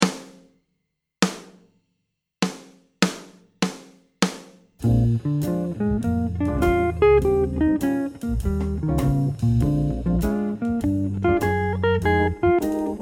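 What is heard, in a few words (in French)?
blues arpèges, guitare jazz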